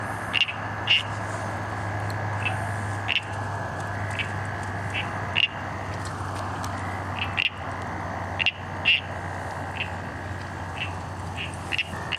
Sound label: pseudophryne major